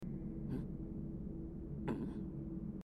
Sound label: no weapon; Zelda - Breath of the Wild; link